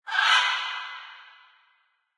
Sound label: macaw; Source Engine; tf; lair